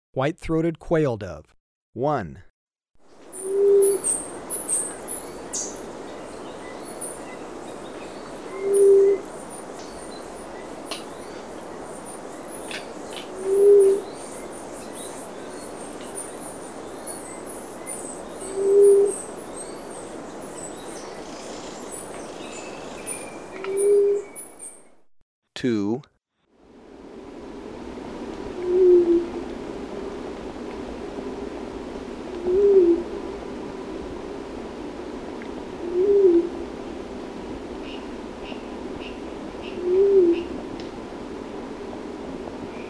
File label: White-throated Quail-Dove; S. America